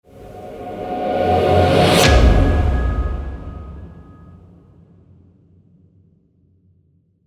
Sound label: Hybrid Epic Logo